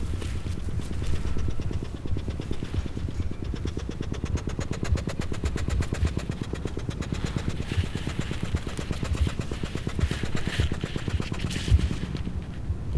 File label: Andean condor